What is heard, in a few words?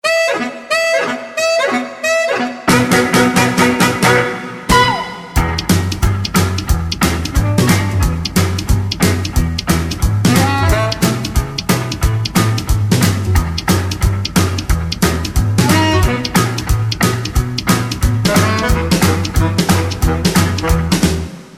E No Backing Vocals, backing tracks